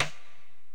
Korg x5, Drums

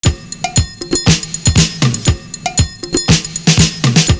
NO CHIMES; Univers Sons - Kitch 70s

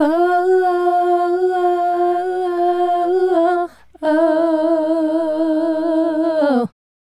Female Group, Hip-Hop and RnB Vocals

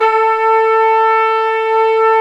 WND FLG SO, Hybrid Winds Flugel & Sop

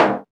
Dofs Rim, Roland BK-5 Percussion

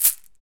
SHEKE, Afro Shakers, Shekeres

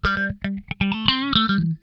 Funk Guitar; Zero G - Funk Guitar

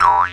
Jaw Harp; musicradar; cartoon caper

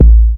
BD ANALOG; Sound & Vision - Gigapack II CD 2

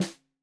Snare 65 Reg Stereo, Deeply Sampled Drums

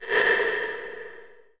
LH R808, REVRB LARGE HALL

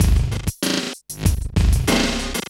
Zipper Loop, Drum Loops Processed; Zero-G Smoke

